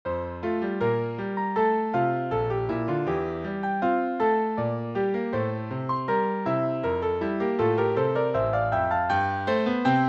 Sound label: mazurka; mazurkas